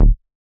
Squarehead Bass D#

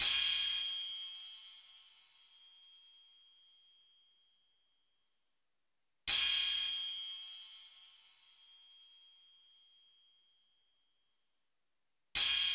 Crash; Cymatics; Light Free Sample Pack; Drum One Shots

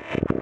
Grid Fragment E 140 BPM, Psytrance; Xenon; Grid Loops

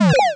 Retro FX; Cymatics; Video Game Sample Pack Vol 1

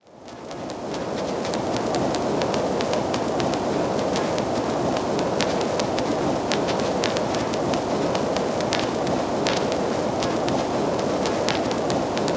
Trap Ambience, Background Ambience; FX; Cymatics; Cobra Hip Hop Sample Pack

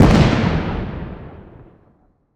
explosion blast; Explosions; PremiumBeat

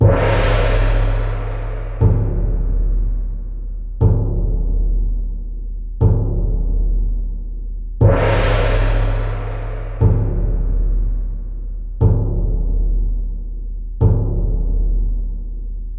Low Drum Death March 120BPM; Ghosthack; Kit 01 - Death March; Percussion Loops

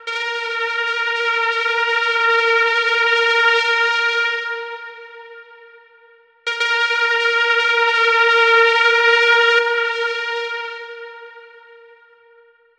Synth Pad D#m 150, Trap; HipHop; Melody Kits; Loops; Ghosthack; Creeps 150 D#m

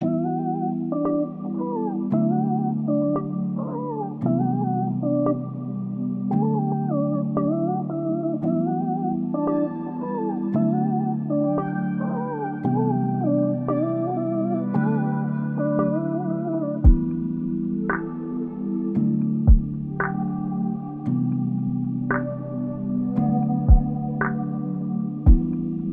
114 BPM E Min; Cymatics; Utopia Sample Pack

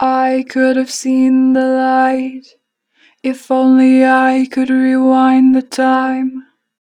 Rewind, Harmor Vocals; 4 bar flat; Pitch Flat

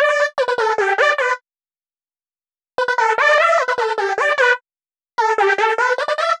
lead, 150 BPM E Min; Stems; Cymatics; Space Party

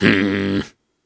Snarls and Hums, Viking Vocal

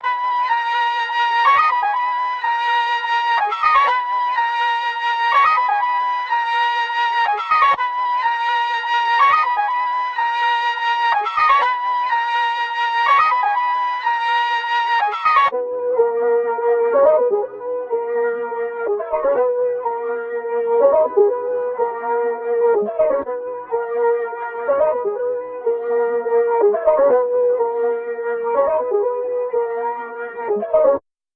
124 BPM G# Min Flute, Loop Stems; Melody; Cymatics; Cymatics - 2022 Melody USB Expansion; Vandal

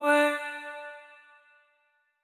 Wet One Shot - D, Tonal Vocal; Cymatics; Euphoria Vocal Sample Pack

